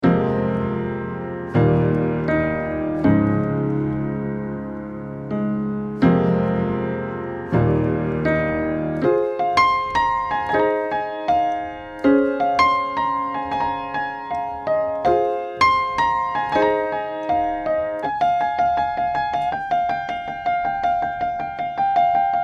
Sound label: Pianola; 1870 Pianola; ALiveLoops